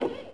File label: walk